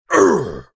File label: Male Fight Damage; mighty party 2017 gamerip pc ios android